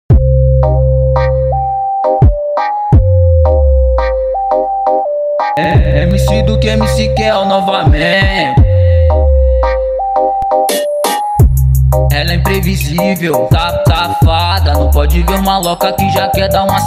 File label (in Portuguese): brega funk